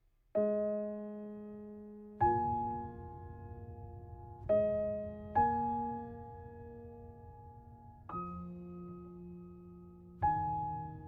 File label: Piano reprise